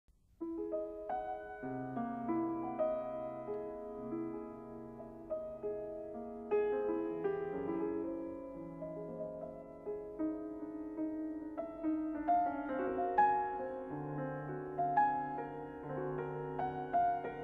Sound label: Feuillet d'album for piano; Discovered Piano Treasures